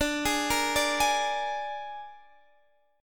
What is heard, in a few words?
Ab Suspended 2nd Flat 5th D, chord